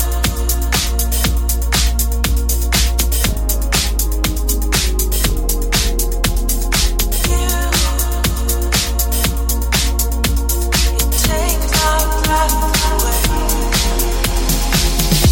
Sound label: Romantic English Music Ringtone